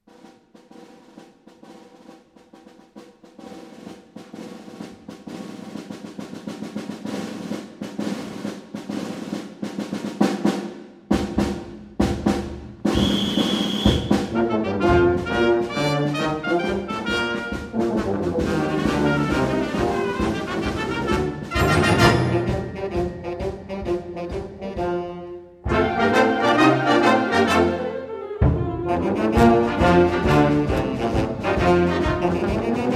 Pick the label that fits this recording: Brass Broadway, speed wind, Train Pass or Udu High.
Brass Broadway